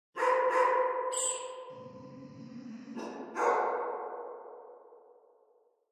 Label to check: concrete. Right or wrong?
Right